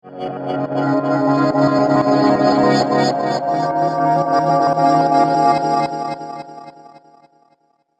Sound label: Future Soundscape, science fiction sound effect; star wars / trek style games